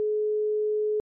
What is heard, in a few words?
ringback eu